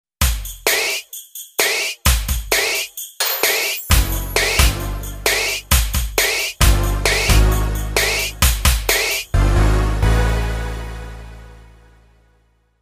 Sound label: spd 20 synthkit